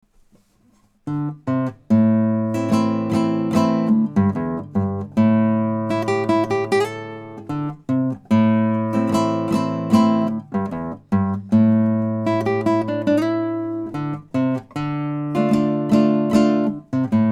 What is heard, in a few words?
Jungle Blues